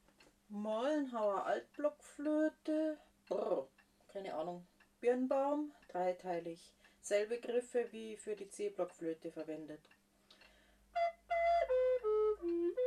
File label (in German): Mollenhauer Alt, floeten; floetenschnipsel